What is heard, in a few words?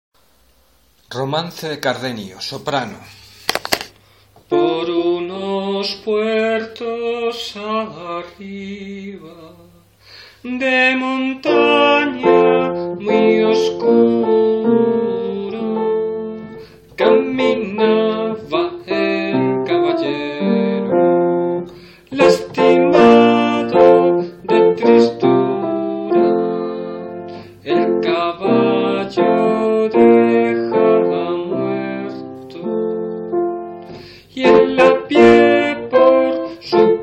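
soprano; Romance de Cardenio en Sierra Morena; Antonio de Ribera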